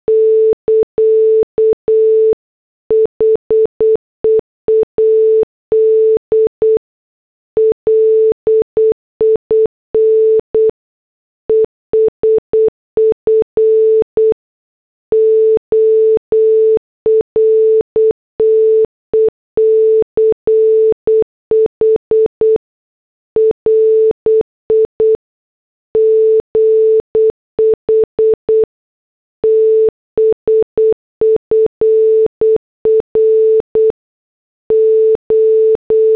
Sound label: morse